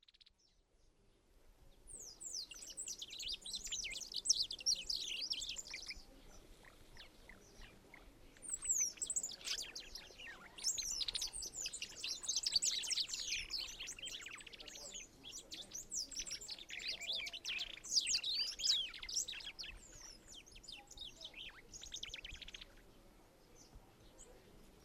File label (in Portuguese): Chopim Molothrus bonariensis; Jacauna